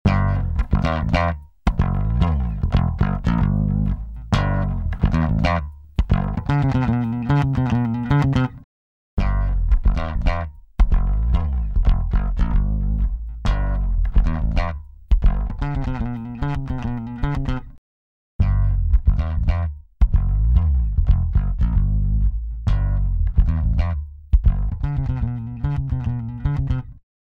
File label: Bass, 47Hz 68Hz; Retro Hi Lo Boost